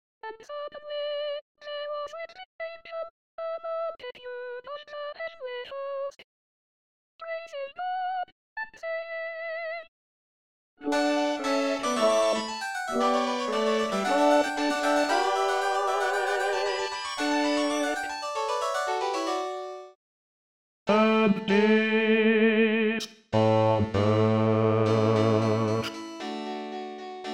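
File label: Glory to God Bass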